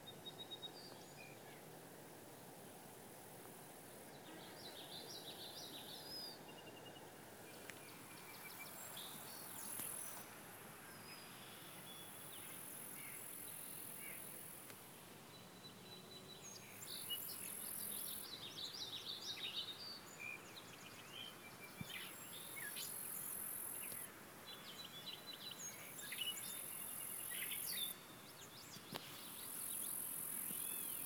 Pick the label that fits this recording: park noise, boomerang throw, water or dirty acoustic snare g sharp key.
park noise